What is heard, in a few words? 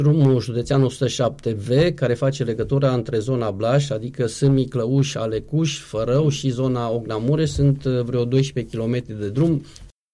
DRUM